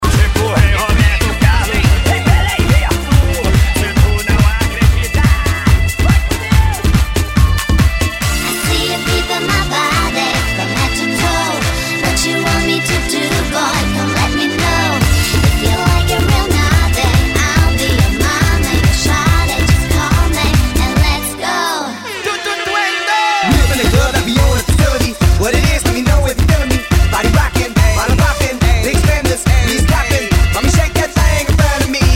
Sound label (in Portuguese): electro funk e electro dance remix